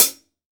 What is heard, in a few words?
HH, PS 2002 HH